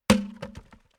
Domestic Throwing Large Log Onto Log Pile Wood On Wood Impact; Miscellaneous Impact